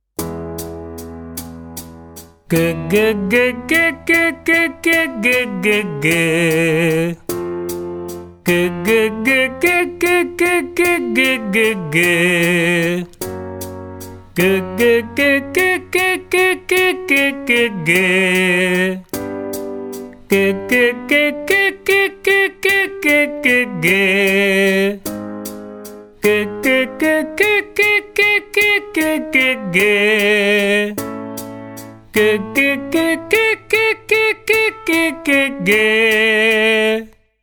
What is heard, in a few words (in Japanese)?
ボイトレ よくあるミックスボイスの練習